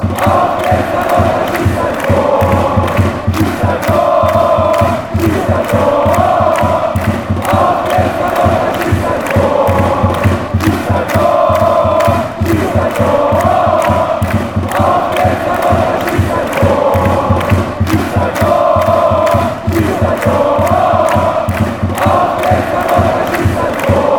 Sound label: score a goal fan chants